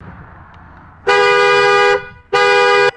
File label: distant short double vehicle horn honk; vehicles